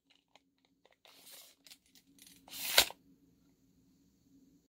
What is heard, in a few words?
Measuring Tape